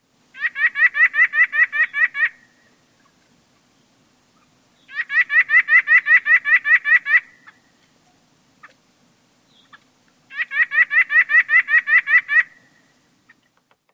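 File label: song, White-breasted Nuthatch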